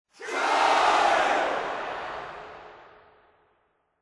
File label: charge; WMBonusXP